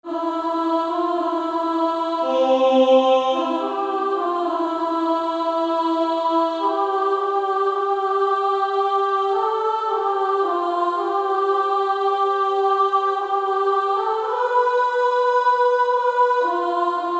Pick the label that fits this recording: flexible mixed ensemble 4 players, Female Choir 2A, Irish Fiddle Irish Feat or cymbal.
Female Choir 2A